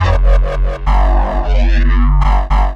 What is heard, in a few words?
Bass loops; neuro; Optiv & BTK